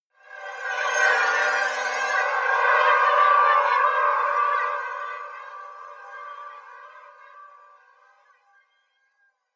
swarm

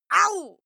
female fight pain ouch; schreie; menschen

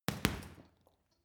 boxing punching bag hit double creak; sport-freizeit; boxen

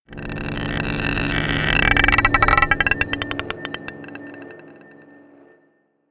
scrapes marble pot dark, drones

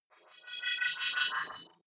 wobble hover swell tonal; energie

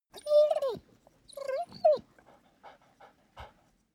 dog whine pant; natur-tiere; hunde

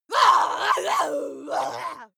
female lunatic scream

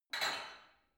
barbell only lift up reverberant; sport freizeit

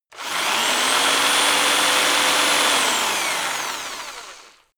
rock drill rev high very long; bohrer; soundpool

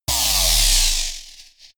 discharged beam; krieg-waffen; laser; soundpool

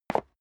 step leather shoes concrete single stair up; schritte

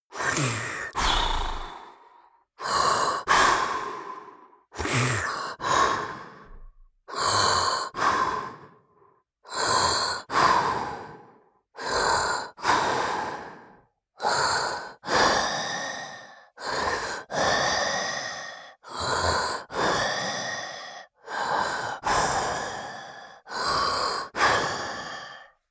monster snore; koerpergeraeusche; comedy-cartoon; soundpool

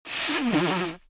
nose blow; comedy cartoon; koerpergeraeusche

